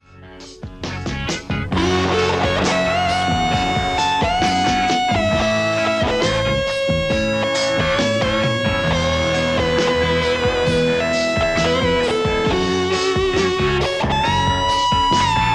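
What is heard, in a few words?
Stratocaster; kuassa vermilion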